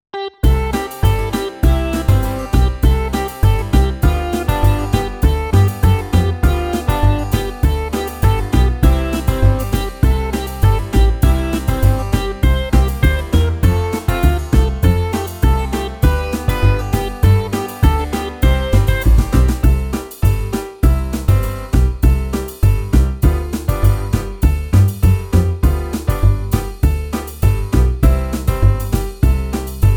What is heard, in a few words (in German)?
Karaoke